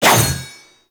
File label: Sword Whoosh; Warframe; Teshin; Weapons